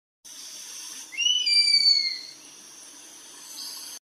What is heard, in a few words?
Harpy eagle; عقاب هارپی